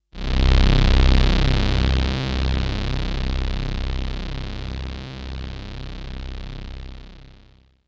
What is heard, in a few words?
SYNTH